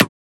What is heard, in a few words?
Transient; FLPRO